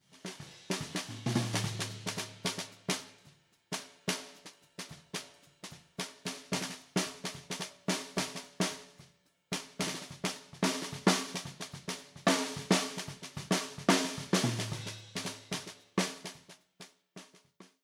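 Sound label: Mix Drums snare drum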